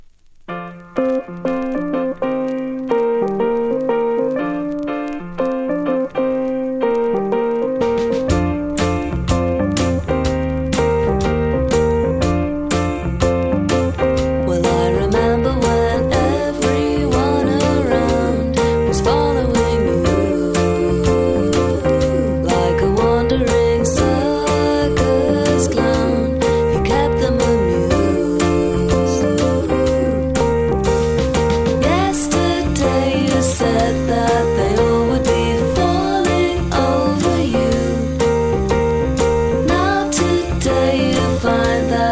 laugh

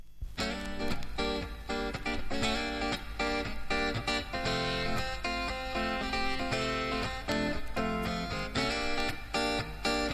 kaze chorus

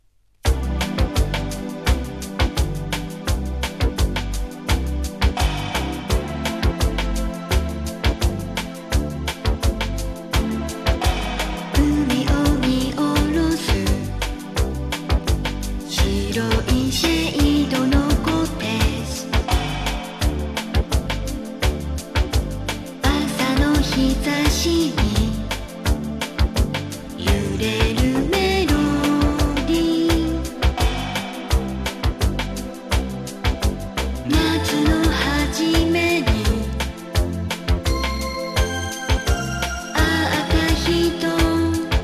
piano

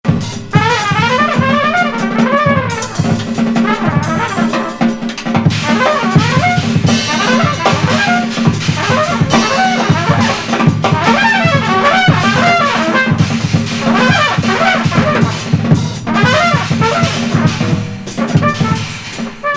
quartet

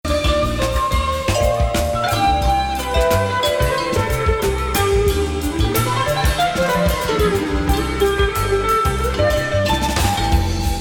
jazz

